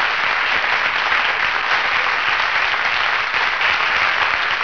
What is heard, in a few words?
clapping